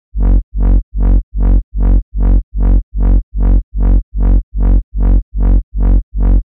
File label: Reverse Bass Loop F# (150 BPM)